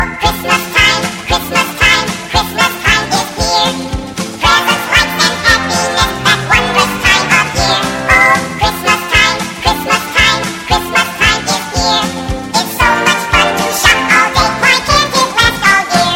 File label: Chipmunk Jingle Bell